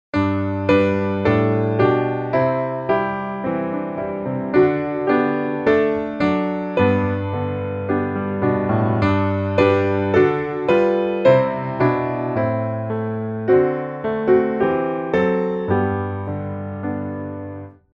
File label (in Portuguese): Sim eu sou de Cristo piano